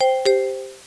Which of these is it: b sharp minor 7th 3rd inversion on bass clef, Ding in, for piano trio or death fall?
Ding in